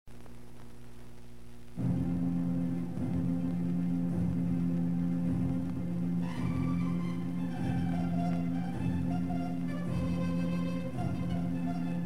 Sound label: Gigantic Pipe Organ